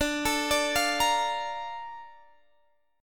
A sharp Major 7th

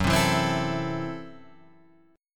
A Minor 6th F sharp

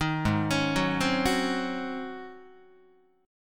Ab 11th Ab Guitar; 6,4,4,4,4,5; Ab11 arpeggiated

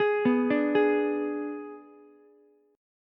G sharp Diminished G sharp, down, Ukulele; arpeggiated

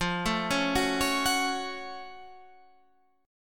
Gb Minor Major 7th F

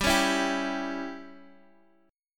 C sharp Diminished

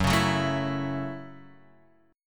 F sharp Minor 7th F sharp; strummed; JGuitar

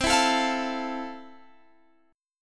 F Major 7th C

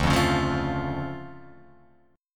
D Minor Major 7th, D, Guitar, Open F; 2,0,1,0,0,0; DmM7 strummed; JGuitar

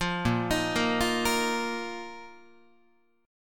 A sharp Suspended 4th Guitar; arpeggiated; A#sus4; JGuitar

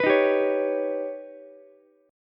Eb Augmented 7th Eb down Ukulele; Eb+7 strummed; JGuitar

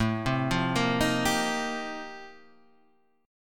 F 11th A, chord

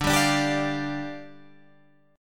D Minor Double Flat 5th, down, Guitar, Open G

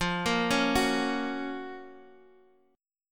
Gb Major F; Gb/F arpeggiated; JGuitar

